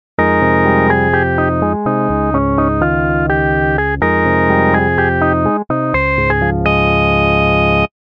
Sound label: rev organ dry